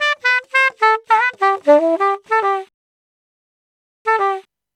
Sax 100 bpm G, Saxaphone

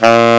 TENOR SAX; Alpine Volksmusik

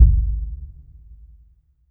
EFX Bathroom Kick; Polyend; Plughugger

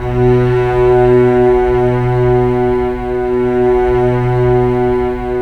Sym Slo; Roland L-CD702

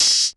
Open hat, retro house samples, Drum Hits

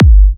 G, kicks; markus hakala kicks

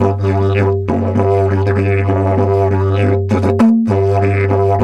G 100, DIDGE 100BPM, Didgeridoo; Big Fish Audio - Didgeridoo and Other Primitive Instruments

